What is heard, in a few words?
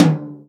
tom, Real Drum Kit, jungle kit